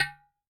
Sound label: Udo F, Yamaha DTXpress-II, Perc